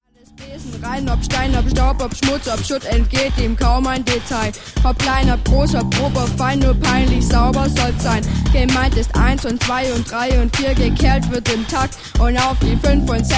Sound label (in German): Strassenkehrer Rap